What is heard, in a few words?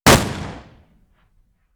colt ext shot